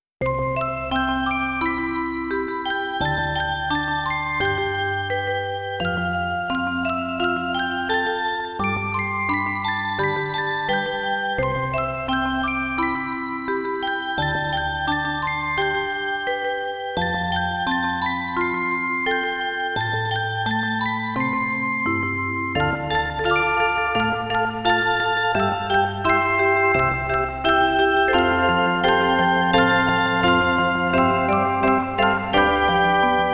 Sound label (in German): Orgel; Harmy